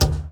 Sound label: Slap; Rugby; PS2